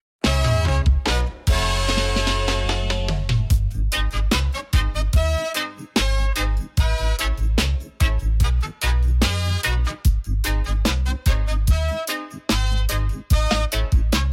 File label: Strategia Reggae